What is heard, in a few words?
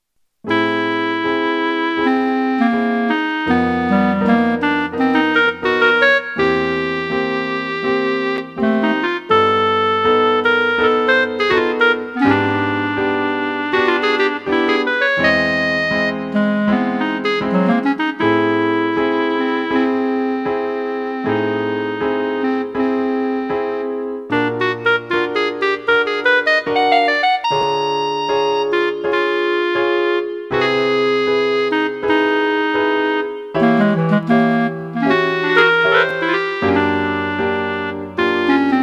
atonal